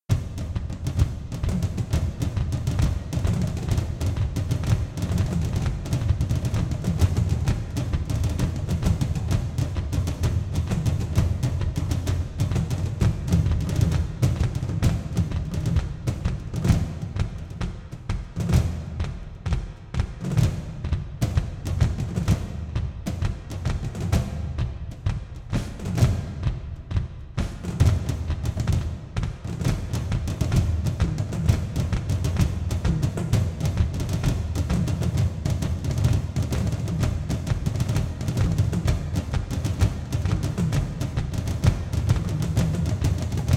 Music Dragon Battle Drums Like A Giant Heart; SyrinScape

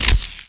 shok FAIL, magic; The Elder Scrolls III - Morrowind; Morrowind GOTY GOG

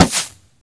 bow shoot; The Elder Scrolls III - Morrowind; Morrowind GOTY GOG

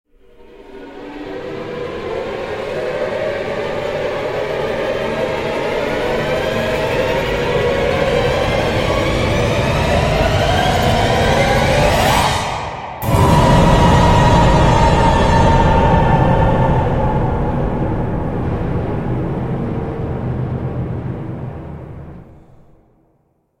choir

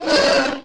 roar

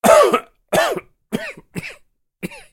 Person coughing; SyrinScape; City gone mad

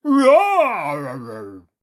dino roar

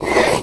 roar; The Elder Scrolls III - Morrowind

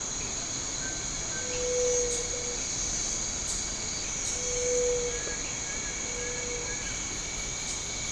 Mourn Ext Amb; The Elder Scrolls III - Morrowind; Morrowind GOTY GOG

